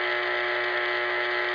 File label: panel move